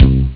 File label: pink bass; jazz jackrabbit 1; M8